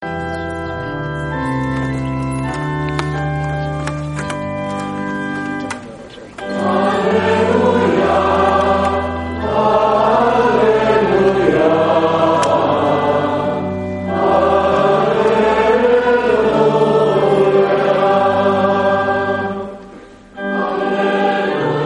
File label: song alleluia; medjugorje 2014